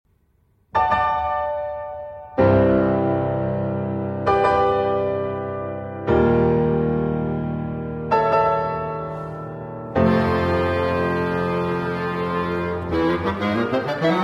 Clarinet Quartet & Piano; Concert Piece; III. Cadenza Tutti

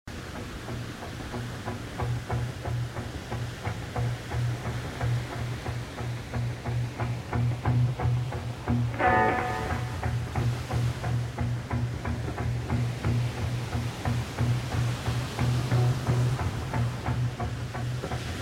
Guitar Solo

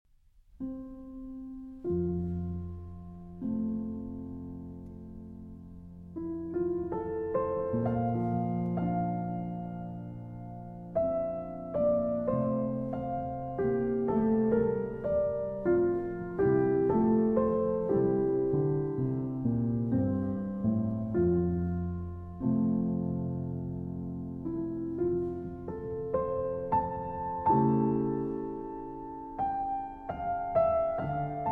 Grand Piano

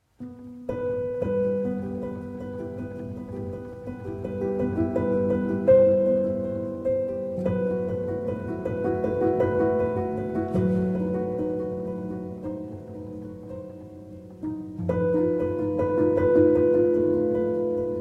Prelude in E Minor for Piano; Op. 28, No. 4

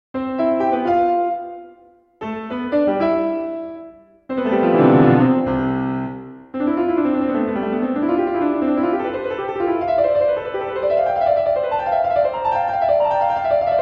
Toccata in C Major, Solo Piano; BWV 564

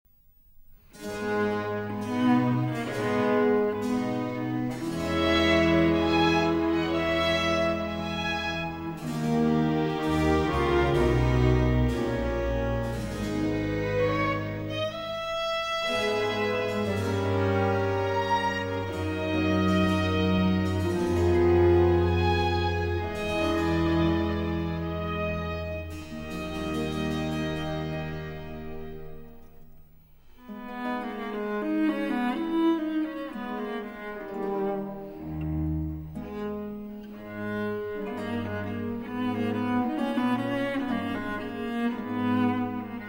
Cello Concerto in D Minor, Adagio; Vivaldi

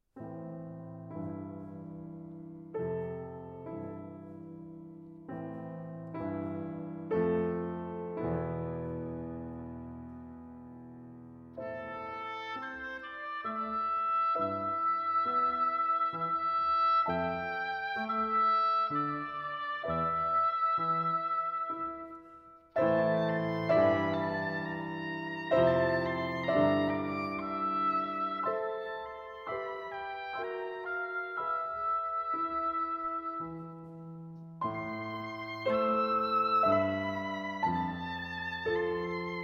Nocturne for Oboe & Piano

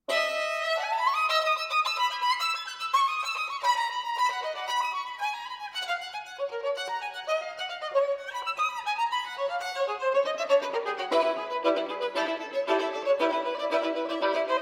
Fiddle Dance Suite, Reel; 1. Sidestep Reel

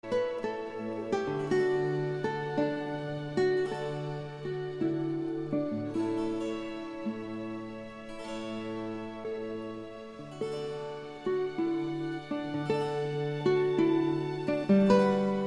Chamber Concerto in D Major, II. Largo

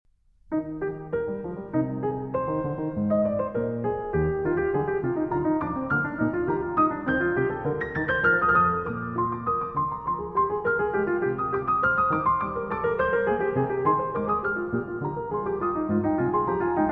Trio Sonata No. 1 in E-Flat Major

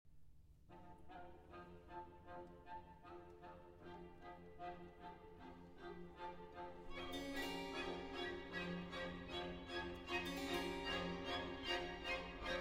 Concerto No. 4 in F Minor, Allegro non Molto; The Four Seasons; Op. 8, RV 297, Winter I.

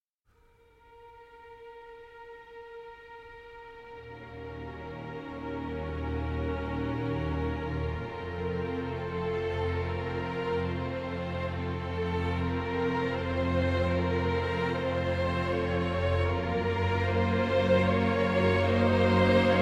Adagio for Strings

